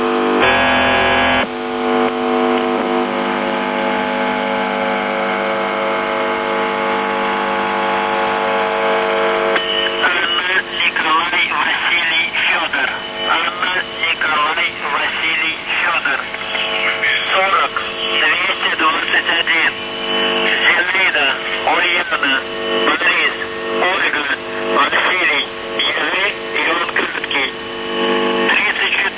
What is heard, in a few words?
buzzer usb msg